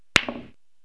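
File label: break, 9ball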